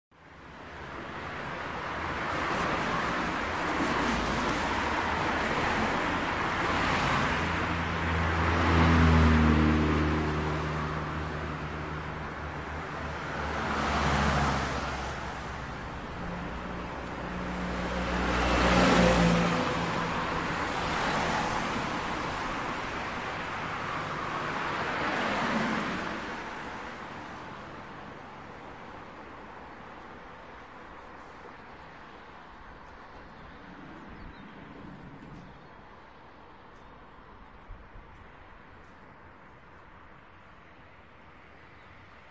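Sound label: west ham ambience; OpenBVE; Railway; Jubilee Line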